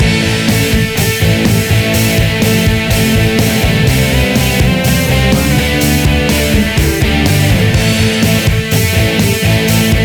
Rock

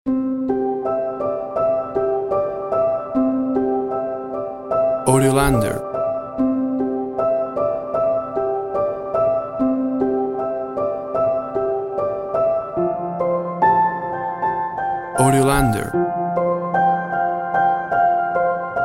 Baby piano

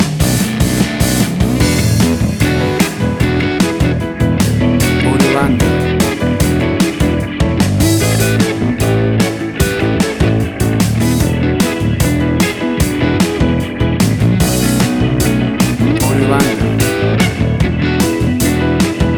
60s Swinging Blues Rock

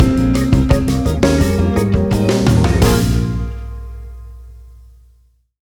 Acid Jazz Latin Fusion Music Stinger

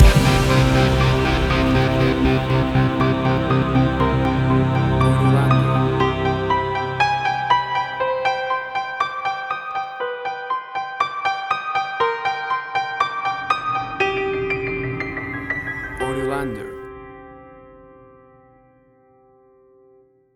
Action Trailer Sounds

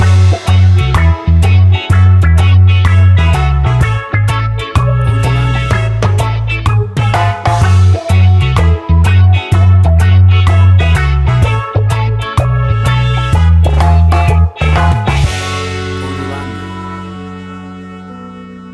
Reggae Summer Island Music

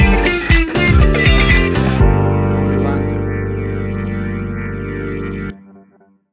A Taste of Funky House Stinger

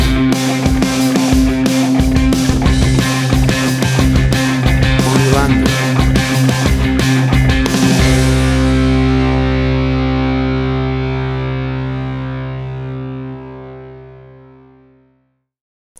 A Basket Case of Punk Rock

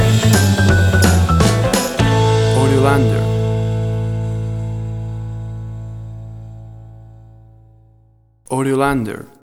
60s Bounce Pop Stinger

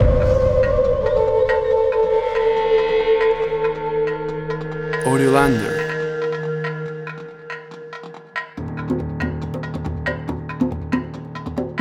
Abandoned, Suspense, Drama

